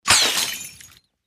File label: Full Bottle Smash